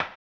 HAMBONE, Vocal Blues Soul; Spectrasonics; Planet

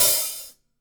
Hi-Hat Open Stereo; Sonic Foundry (Sony Creative Software); Vital Drums

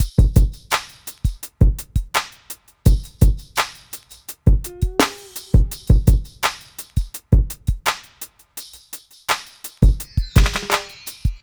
Drums, Hip Hop

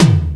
SP-12 KIT; Rubber Chicken Software Sample Bank

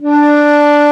SHAKU, WOODWINDS; Roland - LA Composer vol. 1

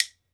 WOOD BONE, Celtic Percussion

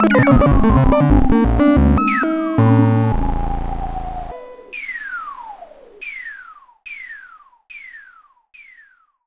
FX, 5U Modular; Polyend; Simulacrum Sounds; 1 Shots; Synths

